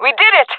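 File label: cheer, jungle female; vo; agents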